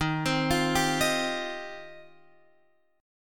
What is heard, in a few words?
D sharp 6th Add 9th, D sharp, down, Guitar, Open F; D#6add9 arpeggiated